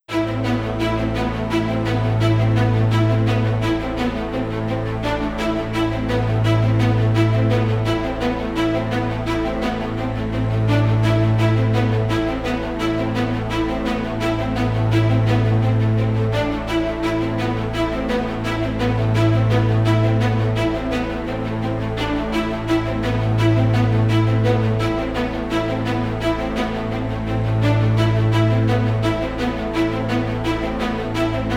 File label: strings cinematic; gerilim; By Burak Sound Bank; tension vol 52